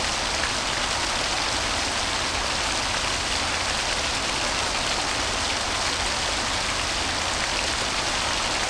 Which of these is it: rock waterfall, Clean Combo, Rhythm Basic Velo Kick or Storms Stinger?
rock waterfall